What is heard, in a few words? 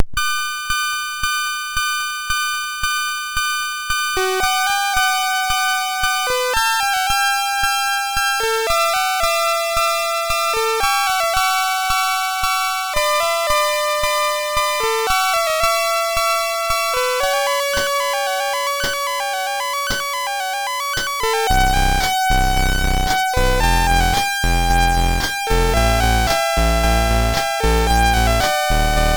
Gentle, MOS6581R3